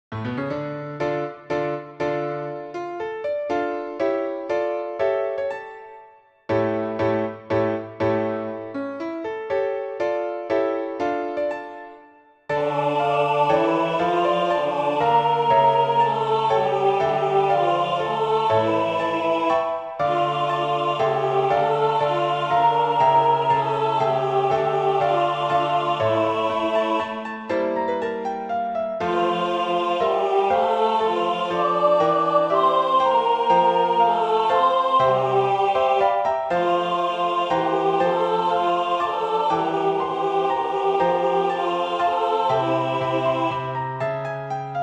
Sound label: Alto; Ave Maris Stella